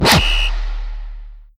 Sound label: Soccer monster hit; Doodle Jump; 3DS, Android, Arcade, DS, iOS, Mobile, Xbox 360